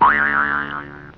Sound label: boing